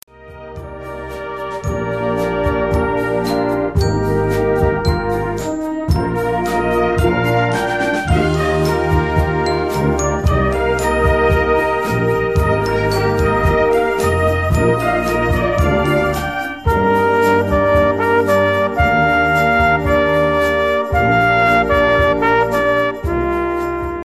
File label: lullaby